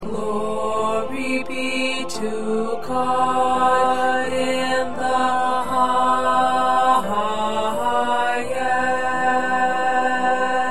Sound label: Tenor, choir